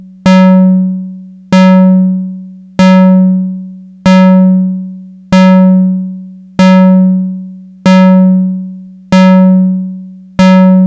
vcf ar fast attack slow decay low res, analog synth; analog synth 101